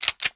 slide back; cstrike; csgo

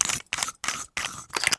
silencer on; usp; cstrike; csgo

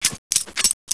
knife look; cstrike

